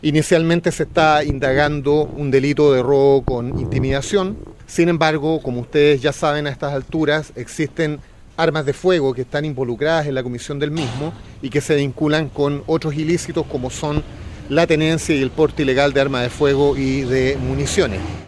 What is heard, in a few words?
glock